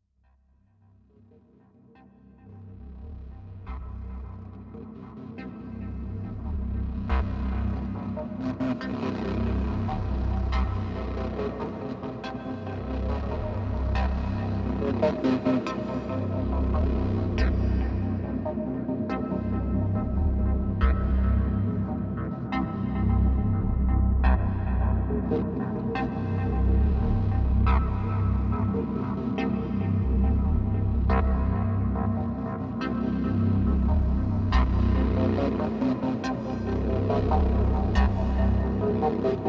Caliginosity Pulsing Synth

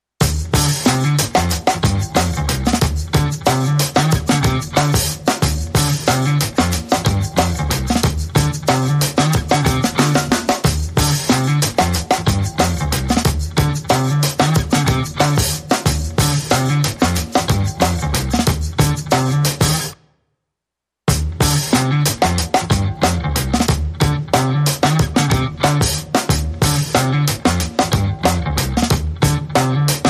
Drums and Bass Mix

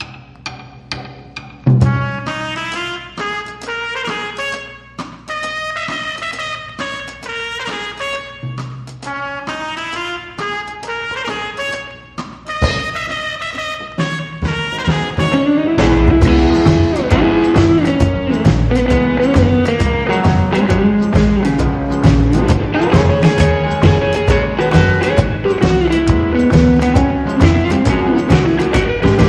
Instrumental

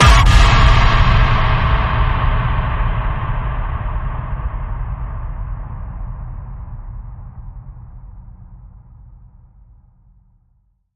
Radioactivity Ping, C sharp

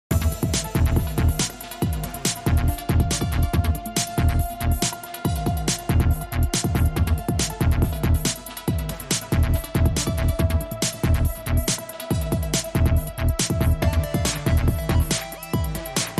8 Bit

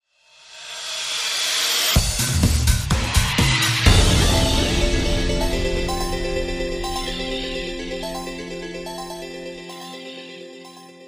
Asian, Sting

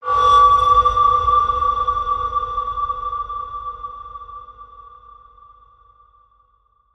Outer Rim Ping

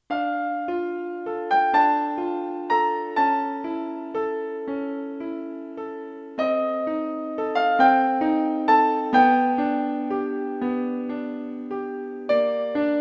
Piano Solo

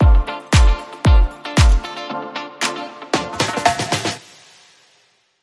Bumper

